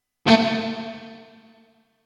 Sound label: reverb; リバーブ２ センド・エフェクト; 元音にかなり深めのリバーブをかけてみました。